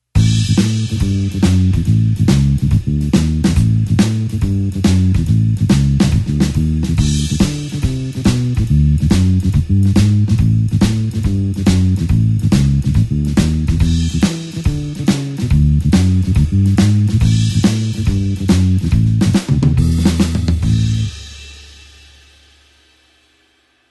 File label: blues bassline shuffle A